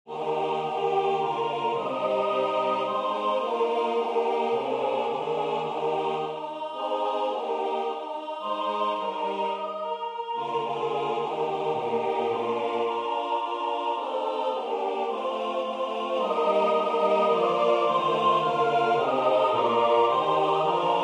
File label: SATB; teach me o lord; attwood